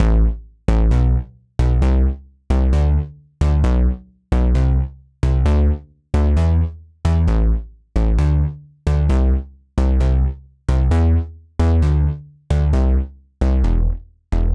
Poly Bass; super crush k.o.

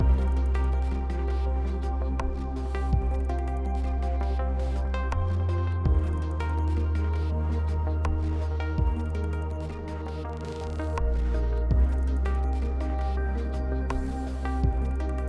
Vive Puzzle 8CH 82BPM; Mirror's Edge Catalyst

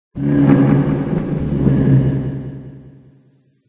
Moan; Bonnie; Five Nights at Ronald's

